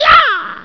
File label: shoot wrench; mario; supermariobros